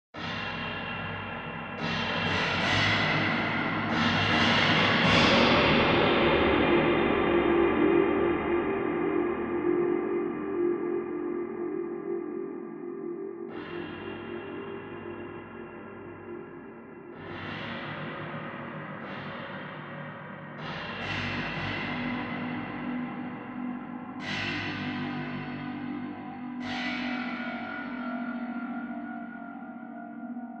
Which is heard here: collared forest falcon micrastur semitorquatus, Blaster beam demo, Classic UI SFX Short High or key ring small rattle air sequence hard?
Blaster beam demo